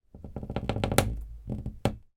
household door creak wooden hollow

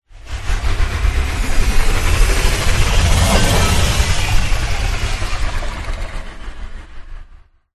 Sci-Fi Power Up; Ryan Van Eerde

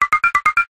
cartoon wood knocks x6 ascending

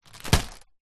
balloon foil helium bounce impact

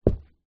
footstep single boot carpet wood floor; foley; zapsplat

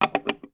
household ornament wooden lid on

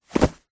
clothing jeans denim pants trousers drop carpet; zapsplat

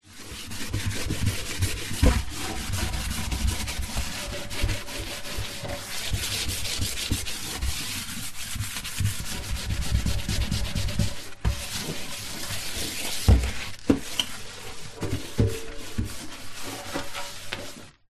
household cleaning wiping dry metal sink

